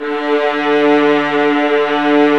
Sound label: st arco violas vib